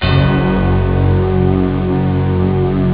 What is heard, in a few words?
bell string; Amiga Soundtracker sample